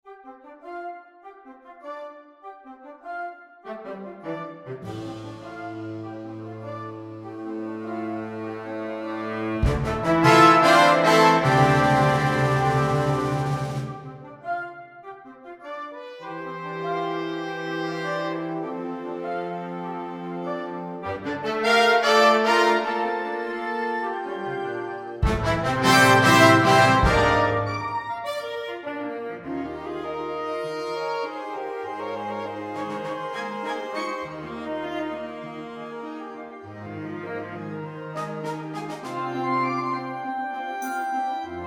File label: Banda, MIDI; saxofono